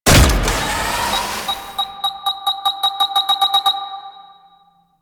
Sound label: Plant Bomb; Warframe; Veilbreaker; Devourer Kahl